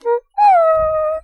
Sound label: Sound Smile